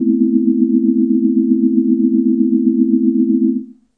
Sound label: seventh, Pads; single samples